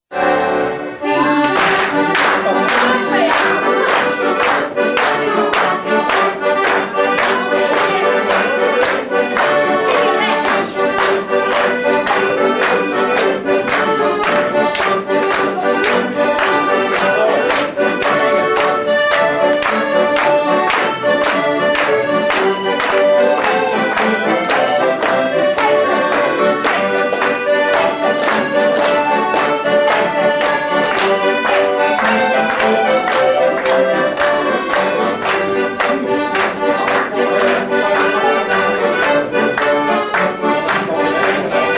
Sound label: Galop